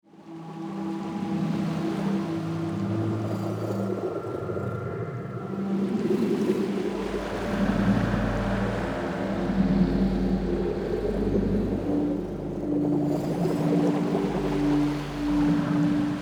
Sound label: mermaid instrumental